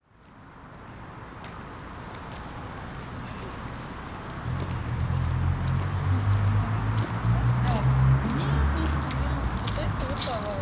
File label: inner yard; vakzo; ptitsegrad; sergiyev posad